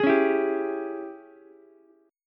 G sharp Major 7th Suspended 4th Sharp 5th, Ukulele; JGuitar